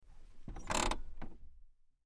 Wagoneer: Parking Brake Lock / Release, From Interior Perspective, Jeep Wagoneer